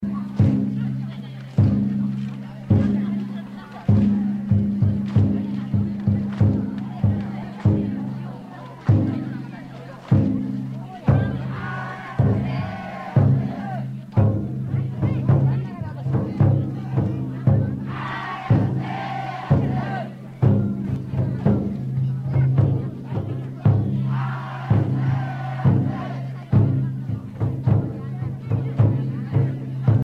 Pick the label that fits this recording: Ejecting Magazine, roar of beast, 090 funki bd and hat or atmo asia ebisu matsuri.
atmo asia ebisu matsuri